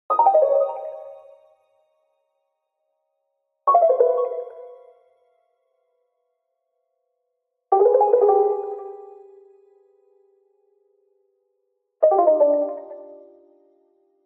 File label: Arcade Jingle Negative